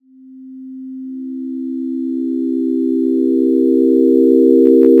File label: chord